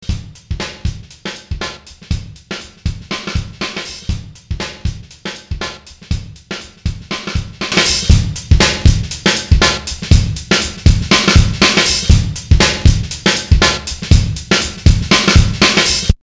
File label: Bass Drum